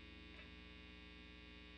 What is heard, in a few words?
quilter r121